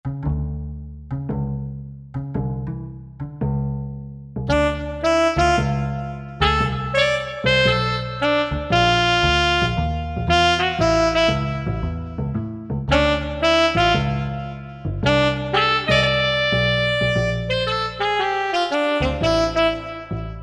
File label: Wood Sax, Korg MSC-1S; Korg MSC-1S Variety